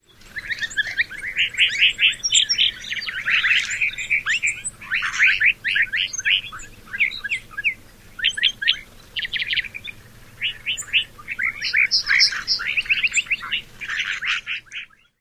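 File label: flock calls, bird; AZ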